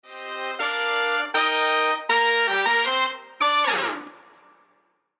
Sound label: Horn Kime Horn Only